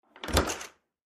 Glass door is opened and closed, dead bolt locks. Open, Close Glass Door Lock, Dead Bolt